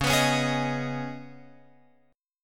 C sharp 7th Suspended 2nd, Guitar Open F; C#7sus2; strummed; guitar chord

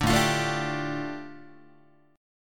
A 7th Suspended 4th Sharp 5th A, Guitar; A7sus4#5 strummed

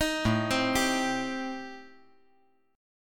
A-Minor 7th Flat 5th, chord

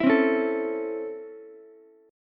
Db Minor Major 7th Sharp 5th Db Ukulele; strummed

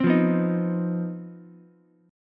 C Minor Major 7th Double Flat 5th C down Ukulele; strummed; JGuitar

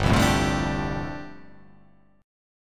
G 7th Suspended 2nd Suspended 4th

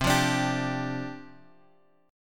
C Suspended 2nd Suspended 4th C Bass Standard; Csus2sus4 strummed

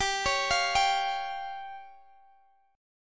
C sharp Major Flat 5th G

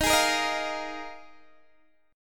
Eb Suspended 2nd Suspended 4th, Guitar, DADGAD

